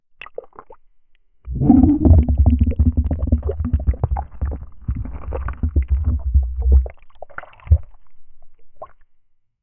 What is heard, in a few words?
underwater coffin interio